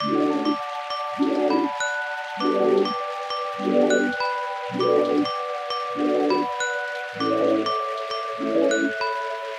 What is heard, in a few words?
melody D maj 100 bpm; bespoke - Floral v.1